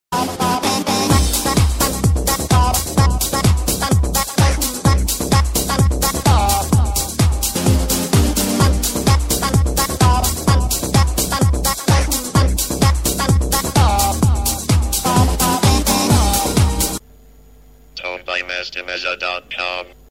Dj Fussion Sounds

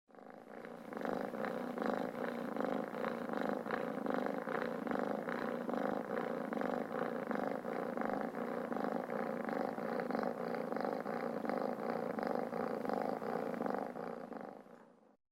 Snoring Cat

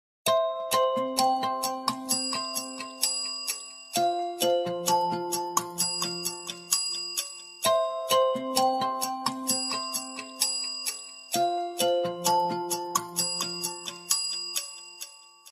Clock Sound

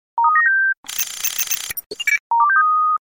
Notification

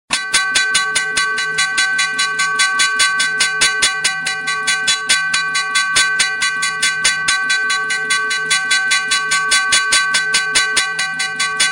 Railway Crossing

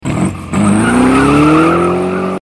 Sports Bike Start

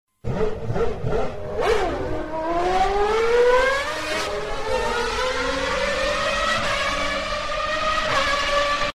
Ferrari Engine Sound

